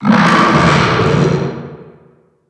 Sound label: alien